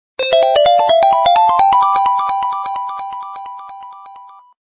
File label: continue sound effect; Sonic